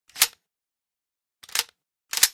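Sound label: fn2000 grenade load, weapons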